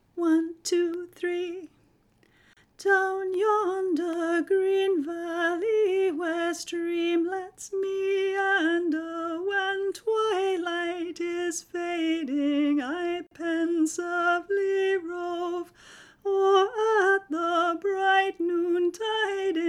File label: tenor; The Ashgrove; Folk Choir music